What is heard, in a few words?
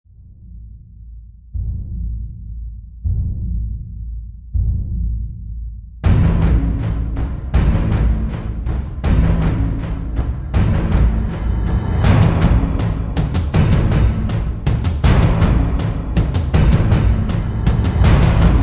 drums fx